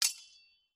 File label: Sword clash